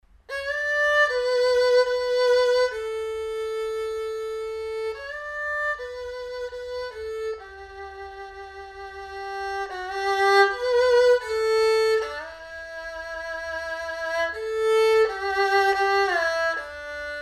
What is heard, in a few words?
erhu